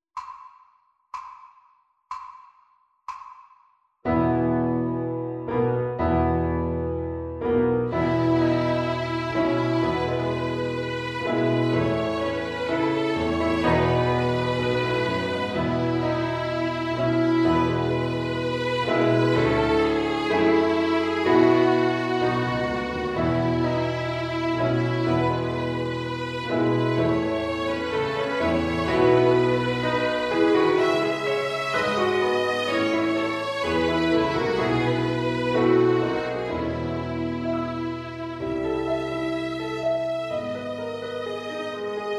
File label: Backing Track; Salutations; ViolinSchool